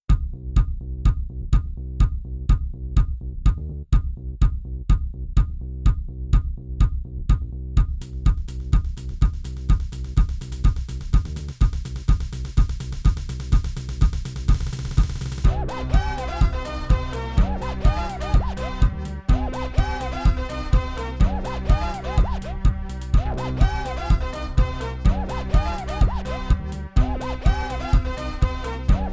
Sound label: fitness music, 432 hz; 125 BPM